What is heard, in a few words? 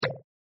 non cluster tap; Skillzgaming; fruit Blast Desktop